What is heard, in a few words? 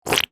slug or worm squish; sound effects one